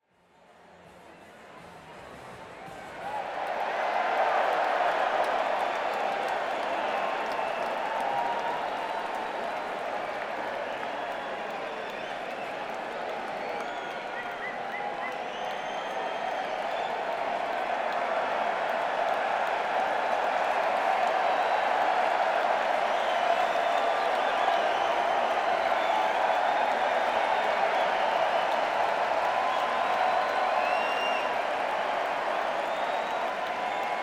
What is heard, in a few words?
Crowd, Madison Square Garden; New York, NY